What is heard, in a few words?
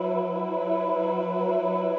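Choir Ahh Loop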